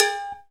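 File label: large cowbell; Northstar - Drumscapes Roland; Bonus Perc; Cowbells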